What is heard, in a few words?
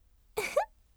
"ufu"